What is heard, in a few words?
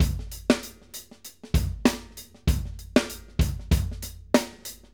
Acoustic Live Drum